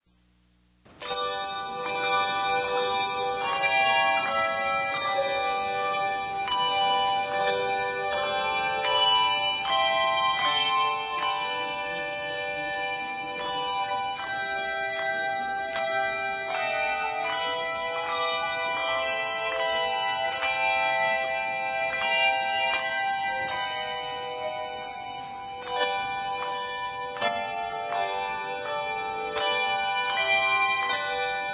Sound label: Handbells; Beneath the Cross of Jesus